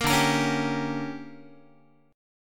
D Major 7th Suspended 4th Sharp 5th, Guitar; DM7sus4#5; strummed; JGuitar

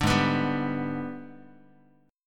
Ab Minor Major 7th Flat 5th, down, Guitar; AbmM7b5; strummed

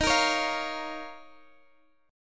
Eb 7th Suspended 2nd Eb down Guitar Standard; strummed; chord

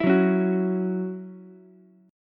C sharp Minor Double Flat 5th C sharp Ukulele; C#mbb5; strummed; JGuitar

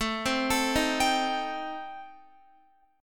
A-Minor 7th Flat 5th, Guitar, chord; Am7b5; arpeggiated

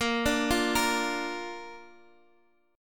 A sharp Major, down, Guitar, DADGAD

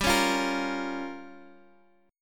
G Minor 7th Flat 5th, Guitar, Open D; chordshape; down

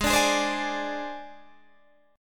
G sharp Major Flat 5th, Guitar, DADGAD; 18,17,18,17,17,18

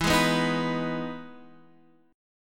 E Major 7th E down Guitar Half Step Down; EM7

